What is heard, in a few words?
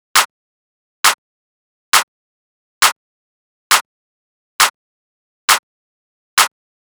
Clap Loop 135, Techno